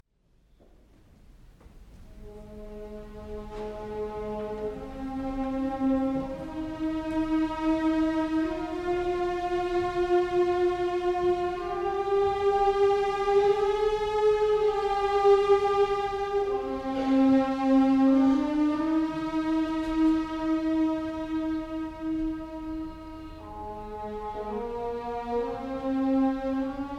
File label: Parsifal Ouverture Basismotief; Wagner; Knappertsbusch; 1962